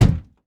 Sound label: wood floor bang